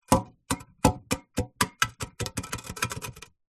household plant pot heavy stone rock move concrete; zapsplat